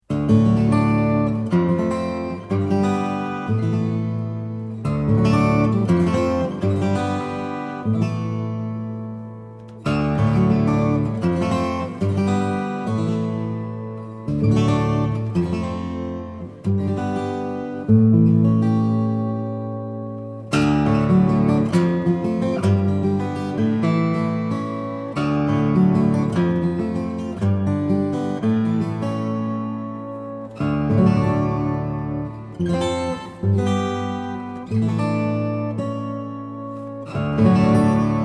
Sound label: Chords For Guitar